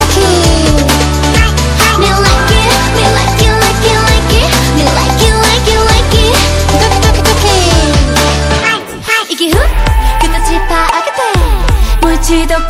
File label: japanese music ringtone